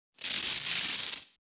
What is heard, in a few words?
electric spark